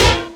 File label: Sample, Roland MV8800, BREAKWILDKIT